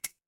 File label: glass glass tap